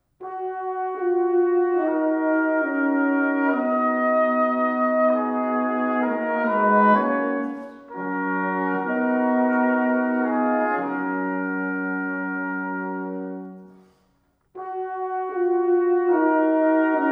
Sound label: Brass Sextet In E Flat Major, Adagio ma non tanto Allegro molto